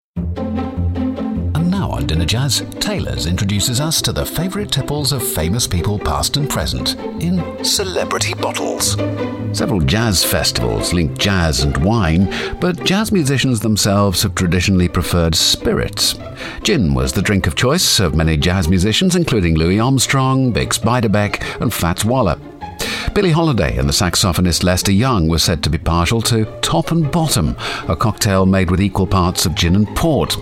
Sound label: bottles jazz; 5 jazz musicians